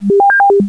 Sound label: Extra Life; Super Mines Demo